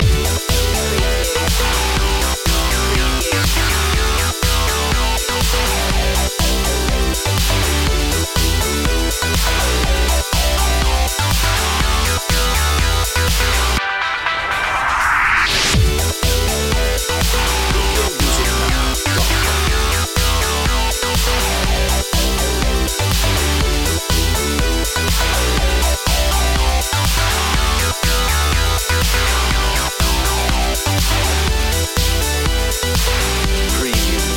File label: anthem gamer long looping; Electronica / Electropop